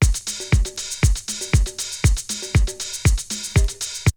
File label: Drumloop; ZERO G - Datafile DISC 3